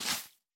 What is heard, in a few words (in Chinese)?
苔藓踩踏声